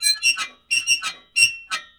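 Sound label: metal 121 bpm; Rarefaction - Poke in The Ear With A Sharp Stick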